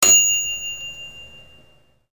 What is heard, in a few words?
ding